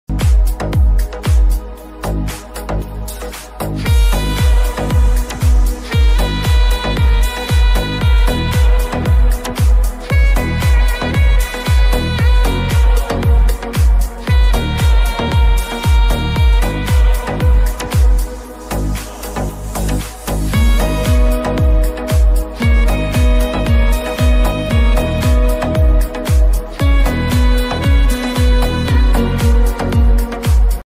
ringtone

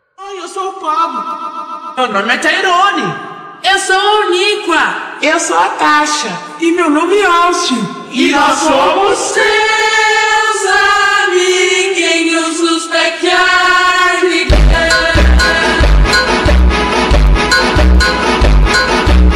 brazilian funk slowed

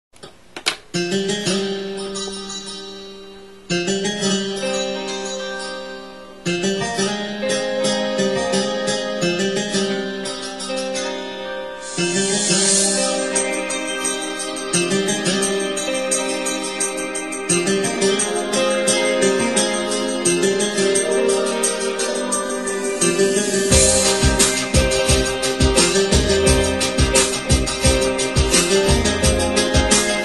guitar instrumental; movie murder

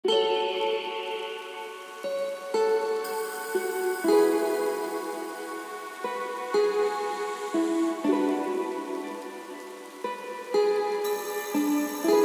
remix

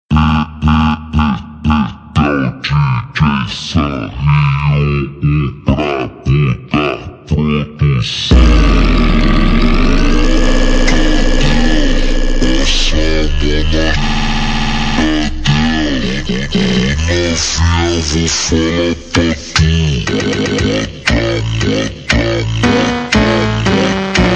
ultra slowed reverb

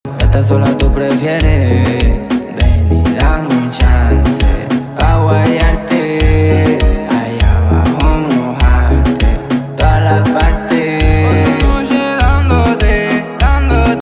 dance musica reggaeton trap remix

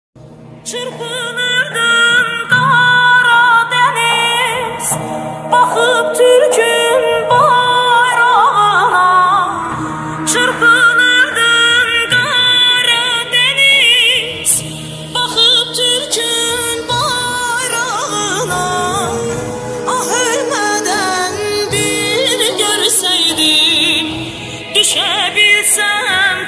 siren remix

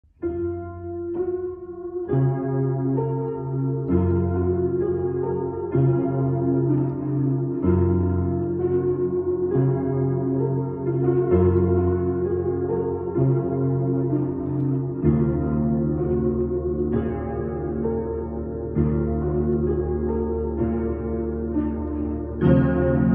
piano slowed; Stardew Valley

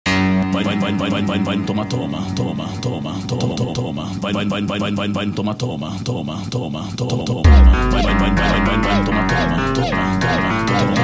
montagem

